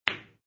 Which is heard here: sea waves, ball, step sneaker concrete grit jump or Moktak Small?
ball